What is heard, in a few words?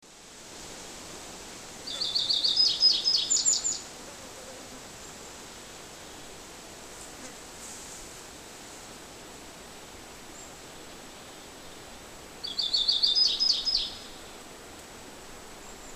Emberiza rutila song